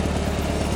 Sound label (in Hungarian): lakokocsi loop; The Wild Thornberrys Movie